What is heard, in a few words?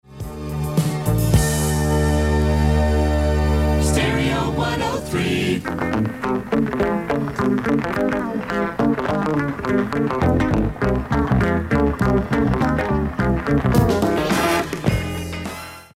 jingle; wdrc